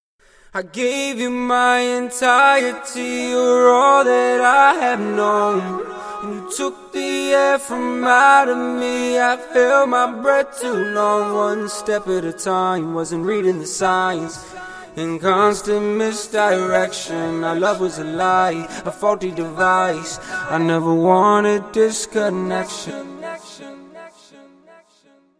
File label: Acapellas, 176 BPM, Key B; Faulty Device